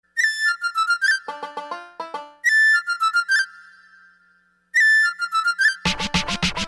best email tone

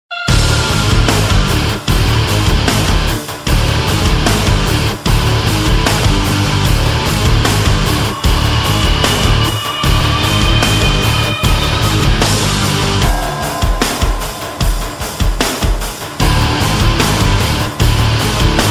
instrumental

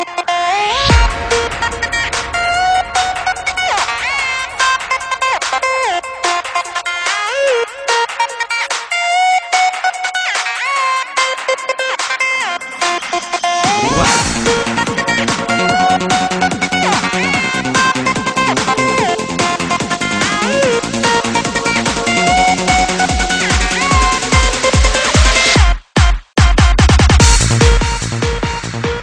progressive psychedelic trance

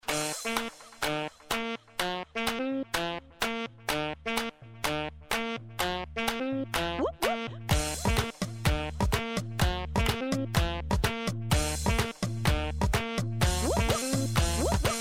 happy saxophone

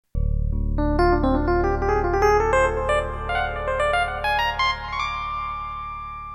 jazz scale

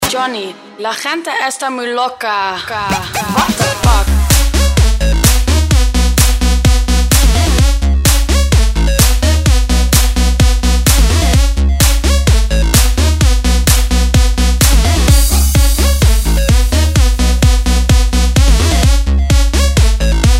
remix

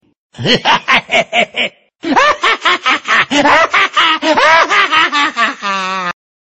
laugh; Dota 2; Bounty Hunter

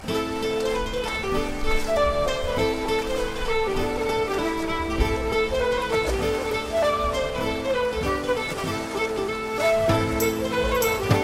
celtic nature song

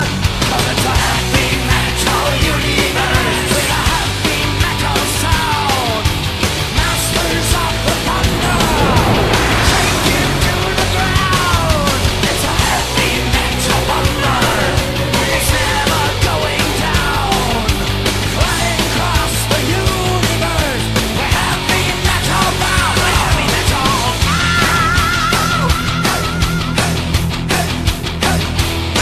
heavy metal; ringtone

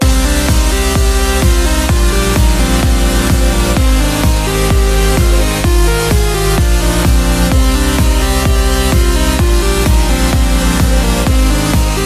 keygen

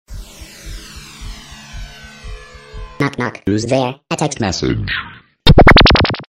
knock knock text message

